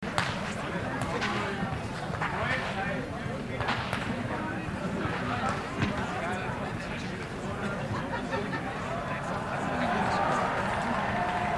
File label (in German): Schnalzen; Feldkirchen; Ainringer Schnalzen